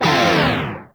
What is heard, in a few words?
Proj Small Fire; Warframe; Lizzie